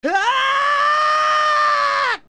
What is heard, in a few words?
Adult Scream; Adult Link; Zelda; OOT